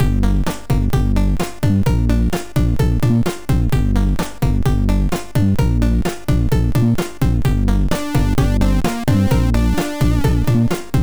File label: sid, MOS6581R4; Electric Girls; Conrad